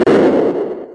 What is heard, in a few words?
explo, video games; xevious